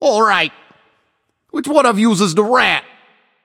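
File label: boohoo, nonverbal; smite; voicepack; khepri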